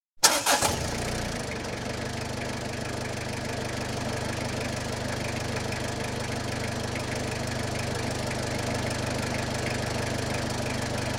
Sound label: Peugeot 309, exterior, switch on, idle, switch off (19 litre Diesel engine); 1.9 litre Diesel engine, 1986/7 model; Car; BBC